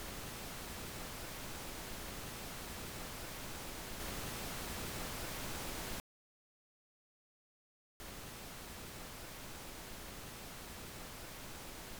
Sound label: 5.1ch L R C LFE Ls Rs